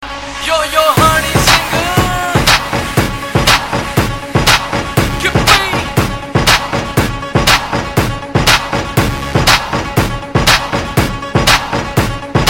Angreji Beat

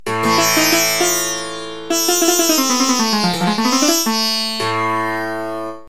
India mystical sound

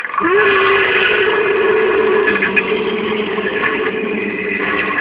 Predator Snarl

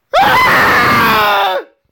Scream of Death

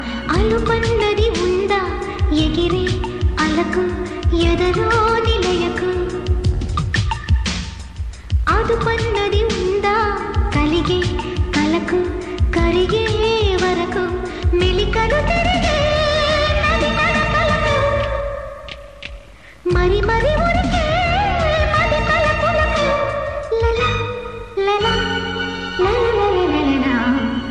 Best Classical

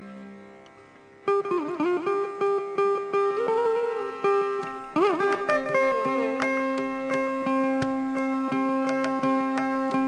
Athana Raga

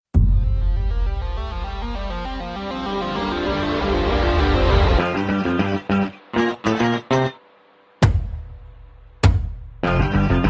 Music

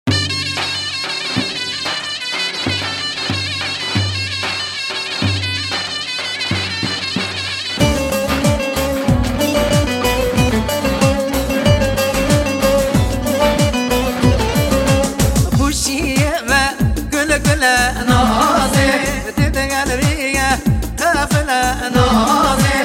Kurdish Halay

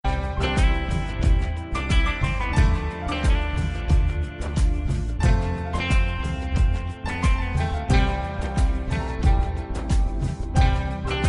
Piano

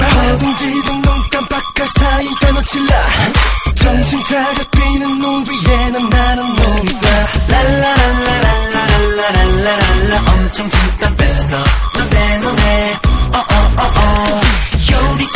Lalala

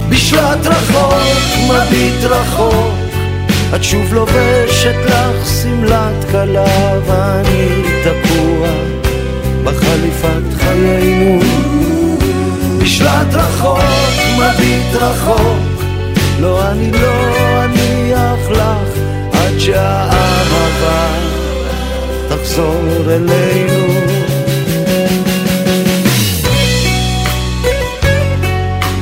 Romantic Song

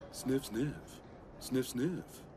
Sniff Sniff

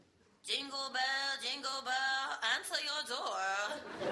Christmas jingle Bel

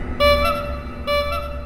Dark Carnival Horns

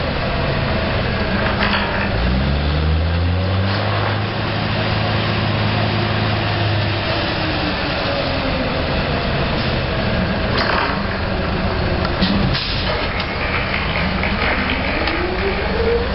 Rig tripping pipe

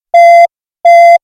Beep x2

